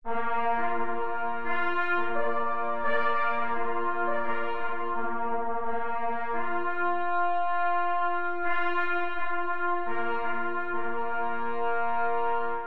Marsz na 3 plesy; Cz. Trybus; Marsz mysliwych

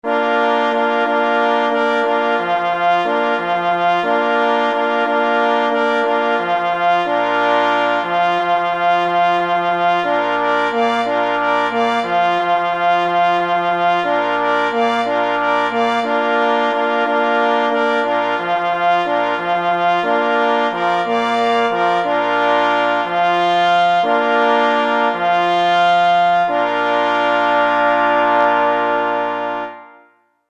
na 2 plesy i 2 parforsy; F. Nowowiejski; Wyjazd na polowanie

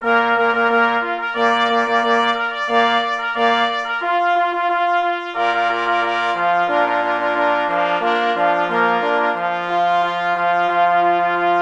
na 2 plesy i 2 parforsy bez sola basowego; I. Malinowski; Witaj w Spale; wersja II

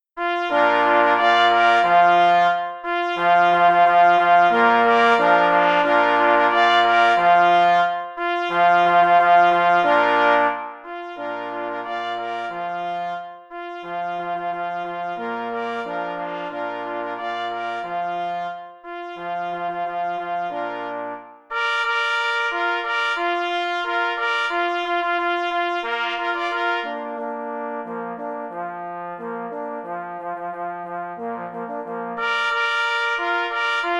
Marsz na 2 plesy i 2 parforsy; J. Rosner

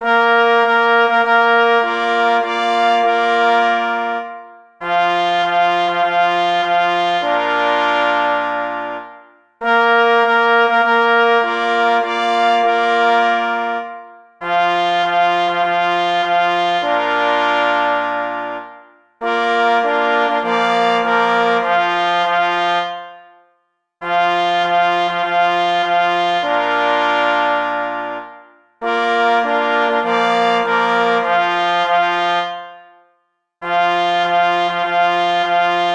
na 2 plesy i 2 parforsy; K.M. Kadlec; Na wprowadzenie pocztow sztandarowych

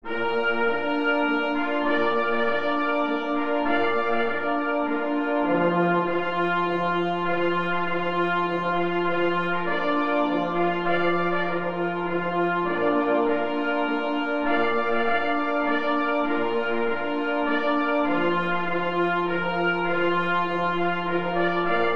Hejnal, na 2 plesy i 2 parforsy; Hejnal Festiwalu Kultury Lowieckiej Knieja

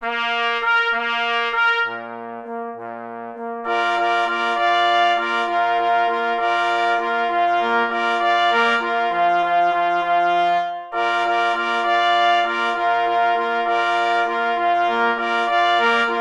Fanfara, 2 plessy i 2 parforsy; Fanfara na czesc gonczego polskiego; pies w muzyce lowieckiej dodatek

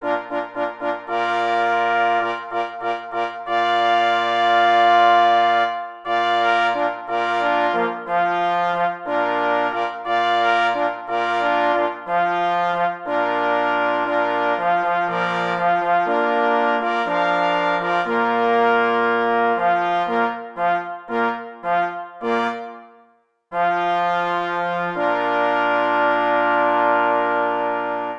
hejnal strzelcow na 2 plesy i 2 parforsy; Cz. Trybus

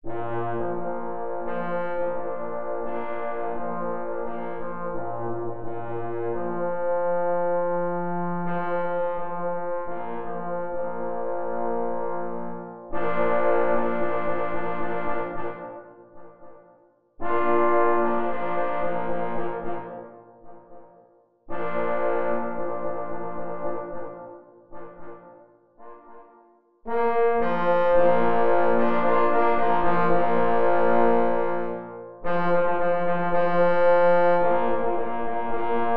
Marsz mysliwych na 3 prforsy; Cz. Trybus

